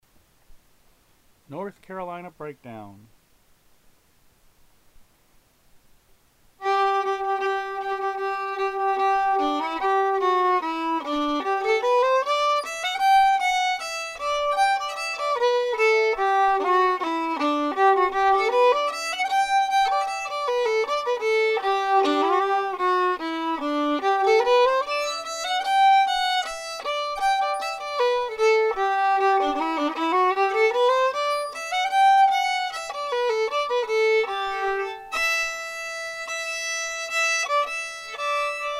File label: Breakdown, fiddle; Festival Tunes for Clawhammer Banjo Fiddle Versions